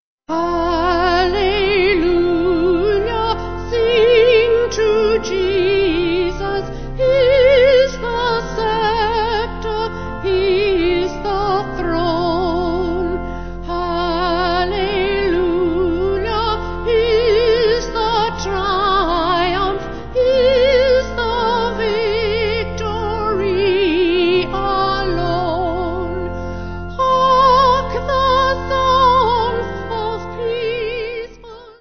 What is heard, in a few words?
Vocal Organ